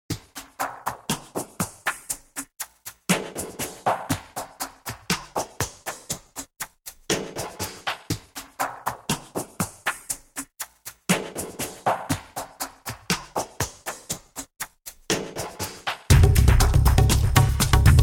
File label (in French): la grotte en toi perc